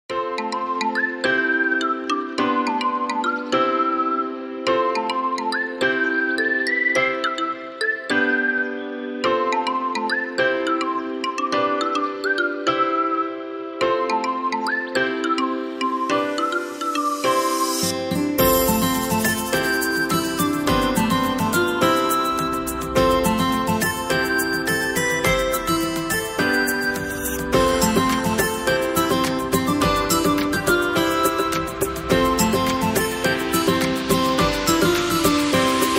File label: Upbeat and Happy Background Music